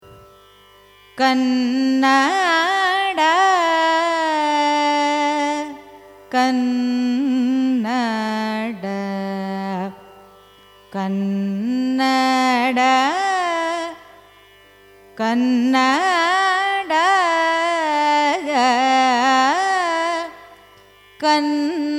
raga kannada signature; carnatic music

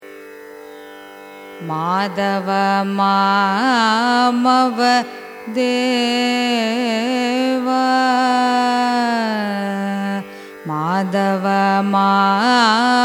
raga neelambari carnatic; carnatic music

